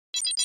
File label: Computer Beep High O, beeps